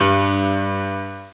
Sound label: grand piano G2; Star Trek JR Audio